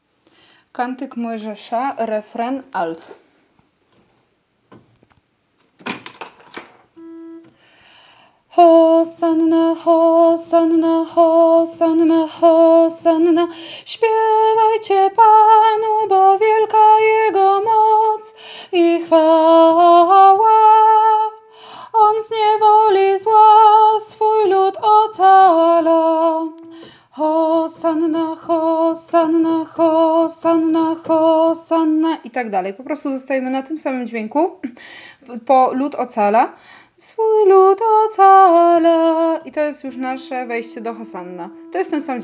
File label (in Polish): Alt refren; Wielki Post; Kantyk Mojzesza; psalm po 3 czytaniu wigilia paschalna